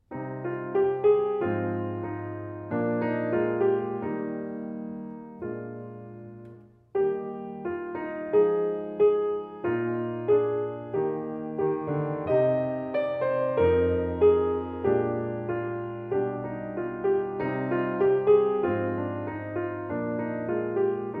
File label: piano; fourmi